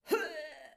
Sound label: Death groan